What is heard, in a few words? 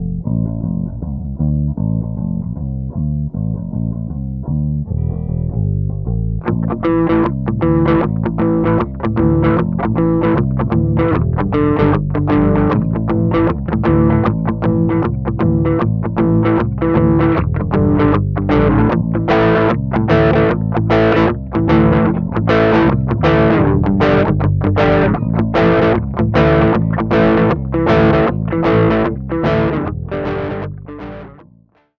grunge